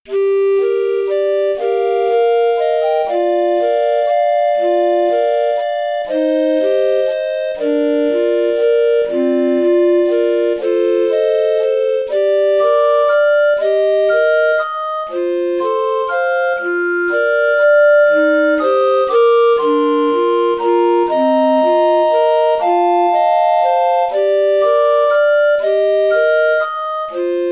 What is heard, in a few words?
recorder trio sat